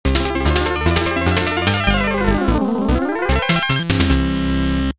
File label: Area Clear; JJ Jeff Kato chan Ken chan; turbografx 16